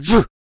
Attack Vocals; Stoner

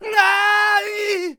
Samurai Attack Vocals

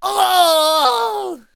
Blunderbuss Death Vocals

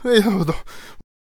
Traveller Attack Vocals

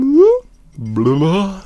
Healer Attack Vocals

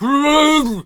Blunderbuss Attack Vocals

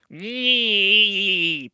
Ice Mage Attack Vocals

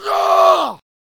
Berserker Attack Vocals

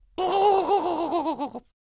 Death Vocals; Scarecrow

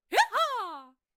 Hay Baler Attack Vocals

